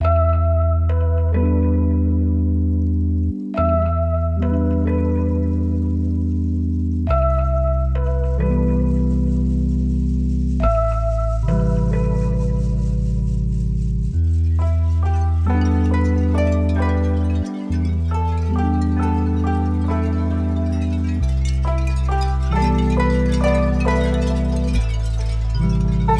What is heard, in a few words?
lofi hip hop